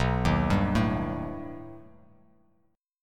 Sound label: B Augmented 7th B down Bass Standard; B+7; arpeggiated; JGuitar